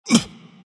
embr pain; dota; ember spirit

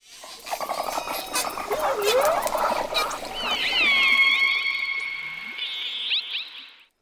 morning reef; ambient; dota

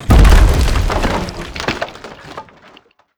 base destruction; damage; engines

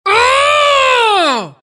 grunt; dota; legion commander

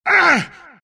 pain; dota; omniknight

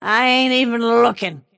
last hit pain; snapfire

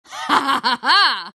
lina laugh; dota

